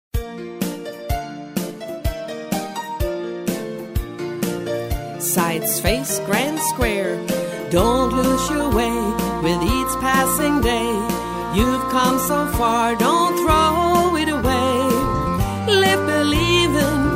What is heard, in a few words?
vocal